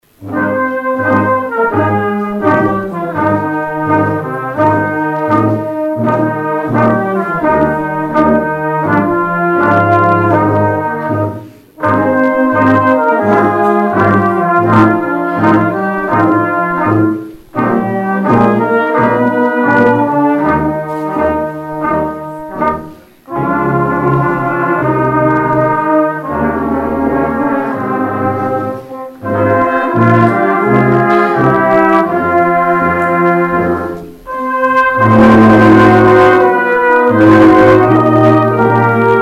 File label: band outro; exit music; St. Mungo's